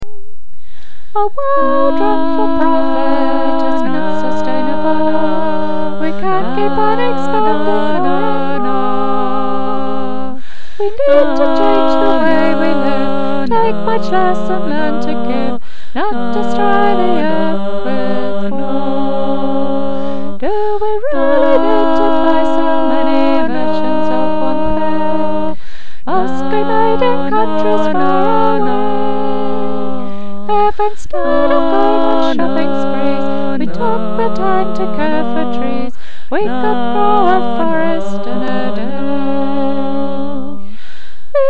no more shopping bass

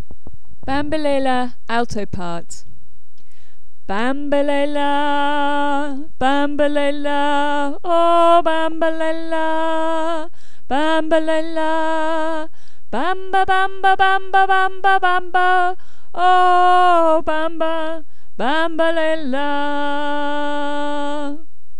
Bambelela alto

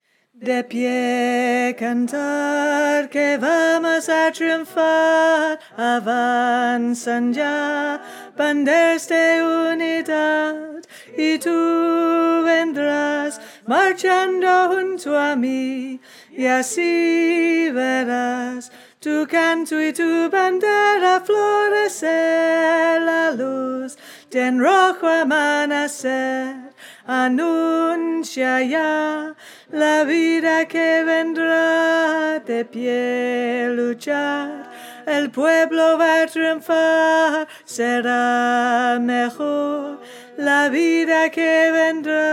alto

